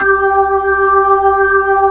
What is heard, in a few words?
B-3, Jazz